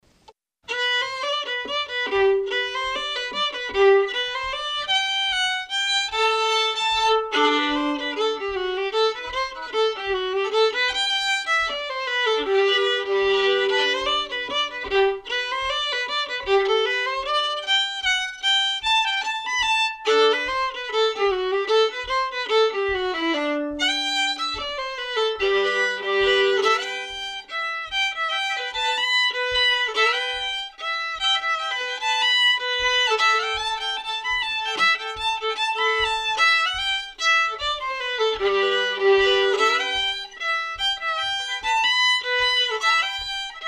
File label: slow, fiddle; west virginia highway